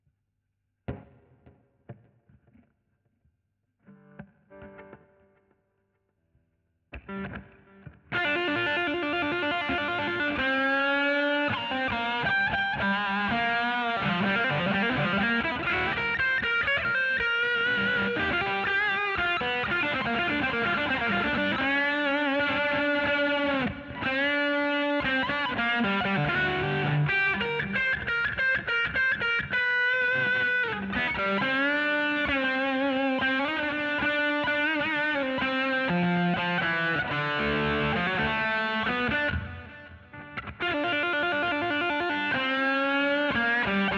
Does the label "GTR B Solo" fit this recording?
Yes